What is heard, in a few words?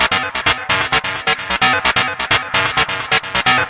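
hard pulse synth arpeggio, 130 bpm; SYNTH AND ELECTRONIC LOOPS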